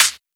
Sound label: kick